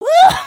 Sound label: freak out, emotes